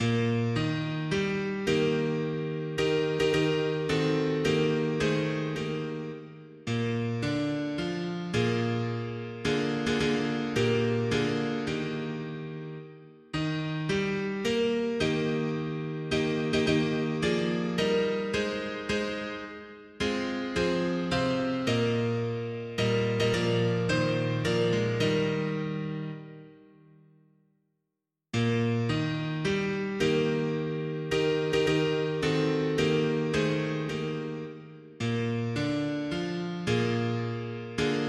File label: mono piano; hymns; Himnario grande